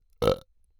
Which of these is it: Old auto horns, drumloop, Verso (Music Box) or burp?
burp